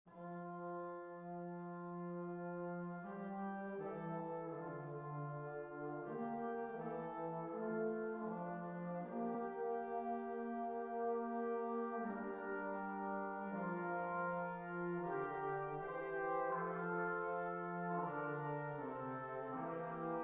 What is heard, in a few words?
Benediction in Brass, Trumpets(2), Trombone, Tuba, brass quartet; My choral Benediction arranged for a brass quartet.